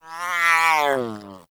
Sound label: Kitten Yawn; Warframe; Catbrow